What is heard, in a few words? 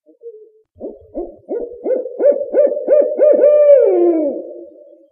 barred, owl